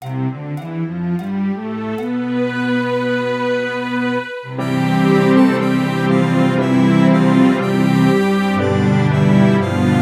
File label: London Bridge Harmonica; Ear Training